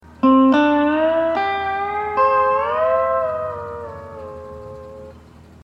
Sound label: hawaiian piece